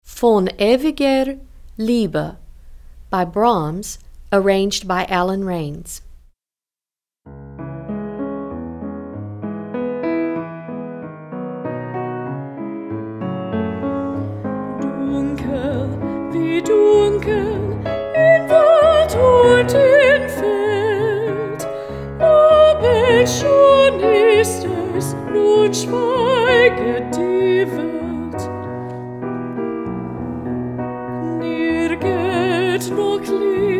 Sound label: soprano 2